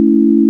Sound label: sine; ものづくり基礎工学（情報工学分野）; 音の波形と分析